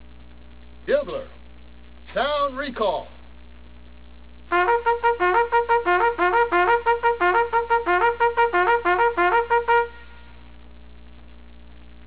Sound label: recall, bugle sounds